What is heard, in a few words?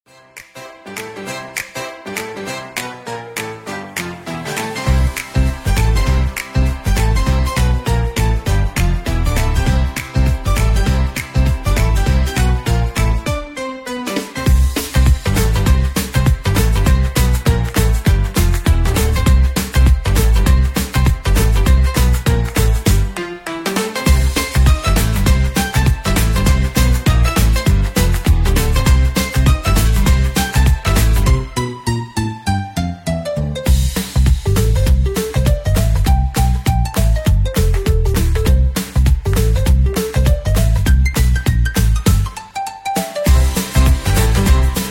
bouncy music 110